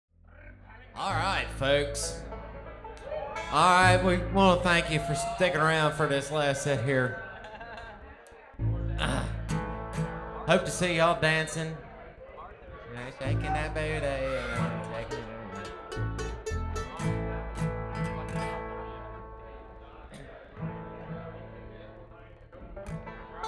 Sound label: tuning; Bluegrass